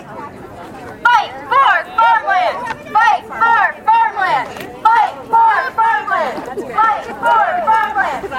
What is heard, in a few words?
Fight For Farmland rally cries, protest rally; Wilmot Fight for Farmland protest rally